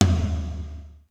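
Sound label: low big, tom; sr16 tom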